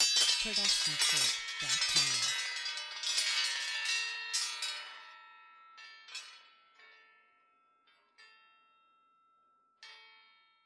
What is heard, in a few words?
Metal; SoundsCrate